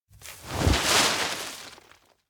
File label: vinyl sheet spread; anemoi